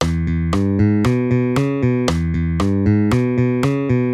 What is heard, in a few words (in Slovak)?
basa, basove figury rock and roll